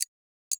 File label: notice level count Down time